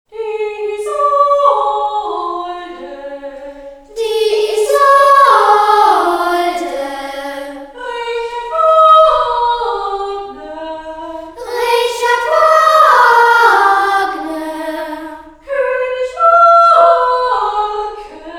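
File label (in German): Übung; musikschule bayreuth; kinderoper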